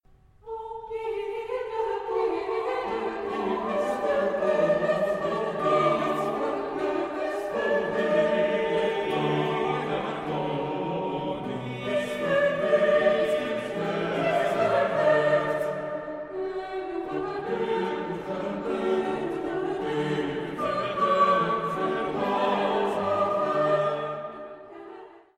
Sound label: Koraalmotet; Psalm 21 1; “O HEER de Koning is verheugd”